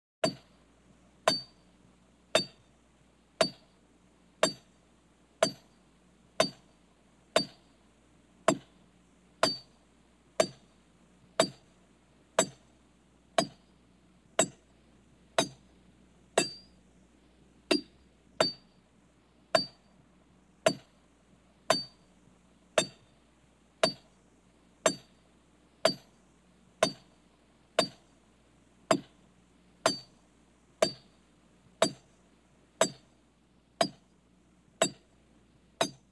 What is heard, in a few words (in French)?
forgeron